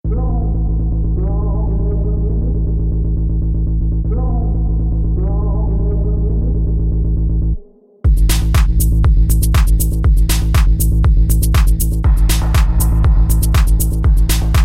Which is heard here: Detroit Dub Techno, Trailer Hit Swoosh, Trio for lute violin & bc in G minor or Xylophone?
Detroit Dub Techno